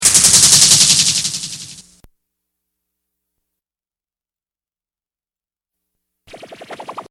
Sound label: Ch Ch Ch